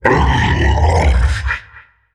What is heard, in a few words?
onslaught channel; weapons; dota; primal beast